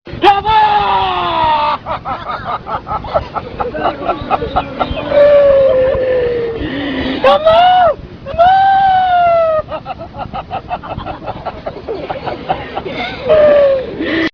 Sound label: wail